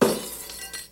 bottle break; singe; Maddog HD

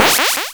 spreadgun; cdogs; funwithguns; techdemo

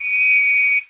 whistle blow; mohaa; mechanics

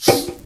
gas tank pickup; cdogs

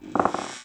creaky board; mohaa

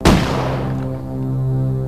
death; singe

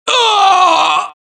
death, dialogue, Allies; mohaa; Mission 2

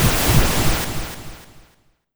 boom; singe; spacerocks

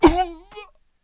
death; mohaa; dialogue

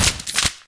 shotgun; cdogs; dogfights; dwango5 map01

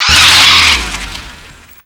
bmw bike big skid; vehicle; mohaa

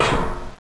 player ladder; xash3d fwgs

